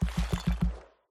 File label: reels fall in; hades River Of Souls; Fantasma